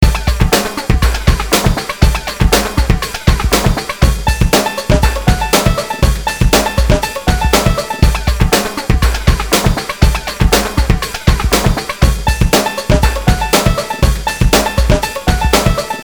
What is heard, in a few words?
depressing rock music